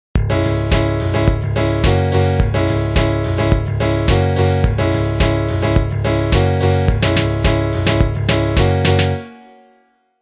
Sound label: riff